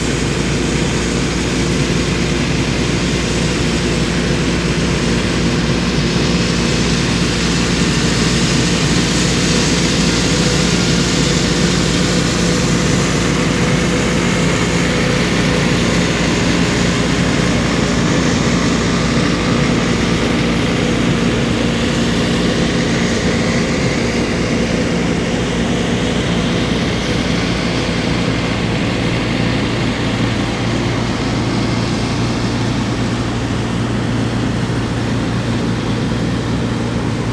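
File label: combine harvester working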